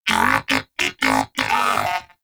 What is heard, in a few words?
Moa Pet Greeting; Pets; Warframe; Tenacious